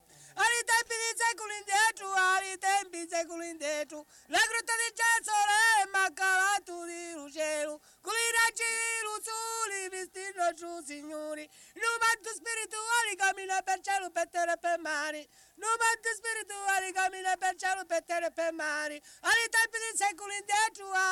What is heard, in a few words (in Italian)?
canto religioso; a li tempi di seculi ndiethru; Cardeto; Mammola; Cinquefrondi; Alan Lomax e Diego Carpitella